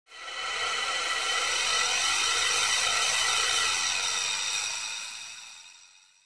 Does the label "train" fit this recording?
Yes